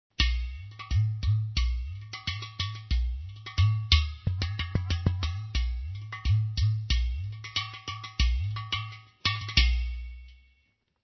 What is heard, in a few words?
udu